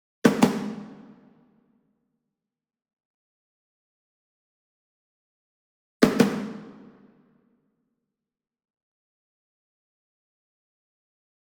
SINGLE DRUM Shime Daiko Double Medium; boom library; Cinematic Strikes Construction Kit

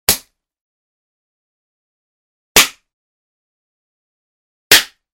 Flesh Hit, Martial Arts and Human Impacts; Hollywood Edge

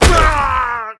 Football Hit Tackle

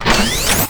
Pickup Scifi; SFX Kit

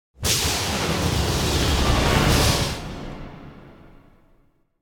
Crazy Drums - Finale Cacophony - Short; Hollywood Edge; sound designer's toolkit v2

